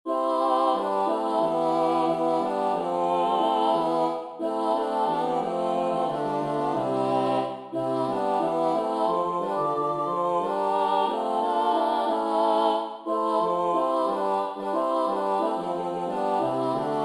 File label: Tutti; Une fillette a son vicaire alla